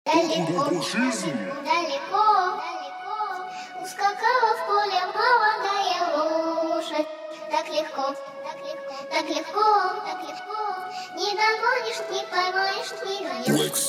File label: 31-35hz low bass